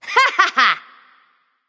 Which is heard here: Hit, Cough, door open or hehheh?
hehheh